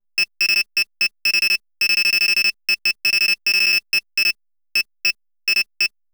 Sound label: Digital Text Data Typing